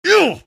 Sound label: pain sharp; engineer; source engine; tf